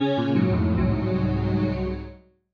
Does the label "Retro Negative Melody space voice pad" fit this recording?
Yes